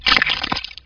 squelch

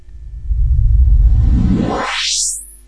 burst zap

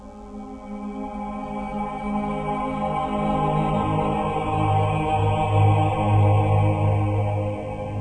chord

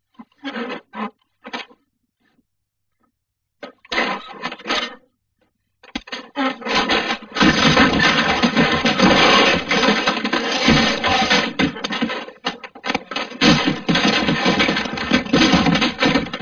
barfight

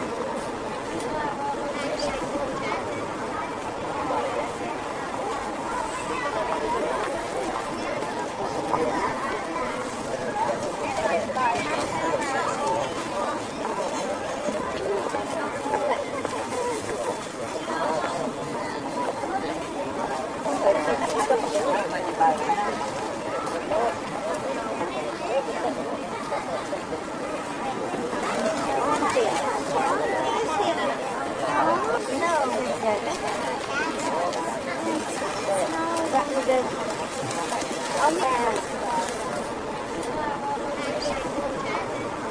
otter market

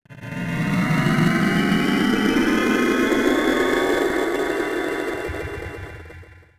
spaceship takeoff

